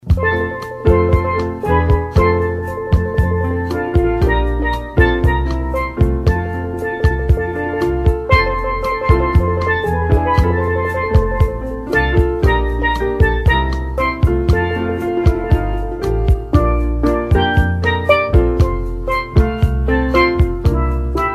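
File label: melody only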